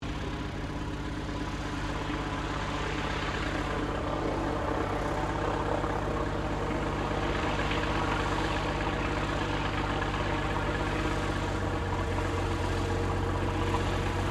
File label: AIRCRAFT CESSNA O-1 BIRDDOG TAXI; Cessna Birddog spotter aircraft; Airplanes; Vehicles